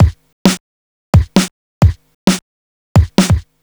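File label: 132 Bass Drum Snare, Drum Loops; Zero-G; Soundclash Nu Dance Electro; Acidized Wavs; 132bpm; Bump n Groove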